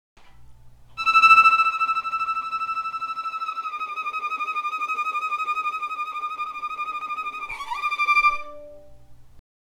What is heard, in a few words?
slow tense violin playing